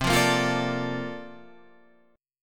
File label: Bb Major 7th, guitar; strummed; chord shape